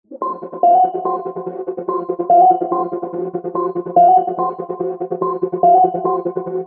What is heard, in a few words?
Submarine Synth Loop 144BPM Fmin; groove techno